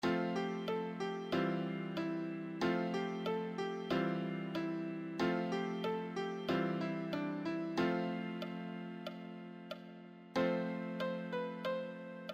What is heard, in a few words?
easy solo, piano